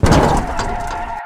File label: shoulder general hit; Blind Drive